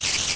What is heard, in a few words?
say, spider; minecraft; mob